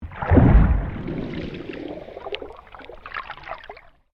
Water Waves On Rocks Submerged Large, Waves recorded underwater near manly beach; Ocean Sound Effects; Ocean (Sound Effects:Nature)